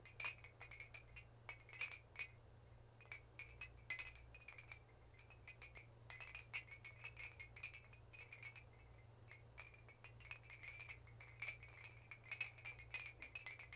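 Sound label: tusken Wind Chimes loop; Star Wars Obi Wan; XBOX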